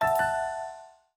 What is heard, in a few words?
Windows Ding